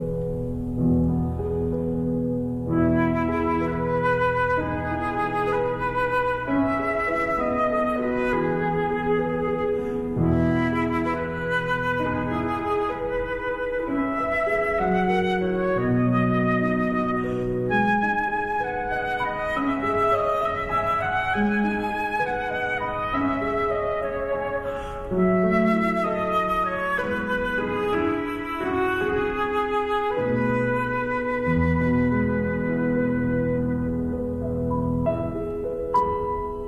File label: Berceuse (Lullaby) for flute and piano